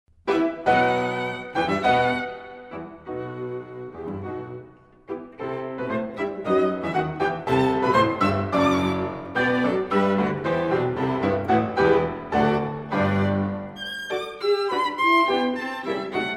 piano trio; goldmark

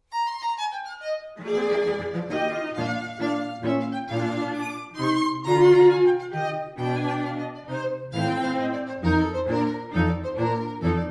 trio

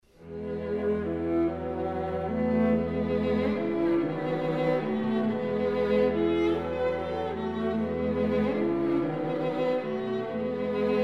string trio

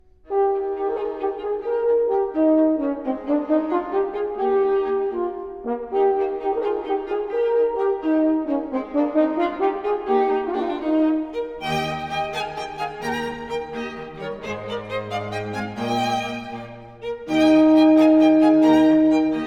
horn quintet; hoffmeister

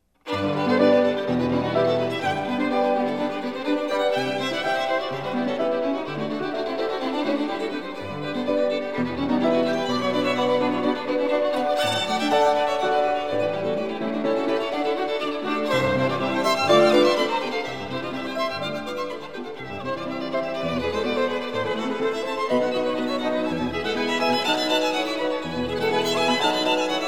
serenade; sinding

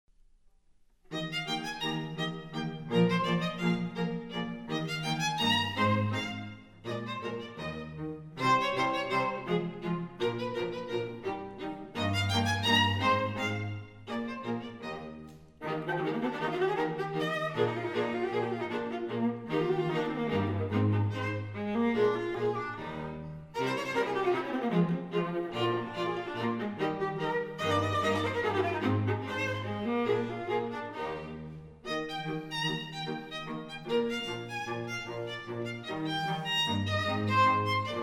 trio

